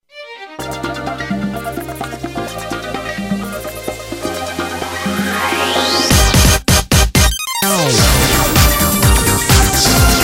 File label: Spanish